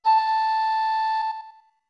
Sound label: Flute A long